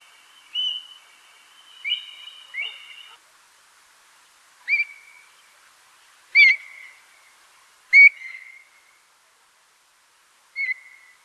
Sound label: call, Stone curlew; Burhinus oedicnemus